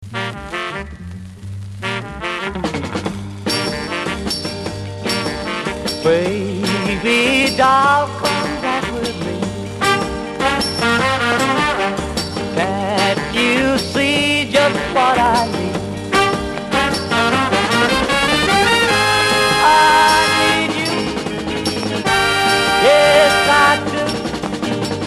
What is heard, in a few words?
rhythmmessage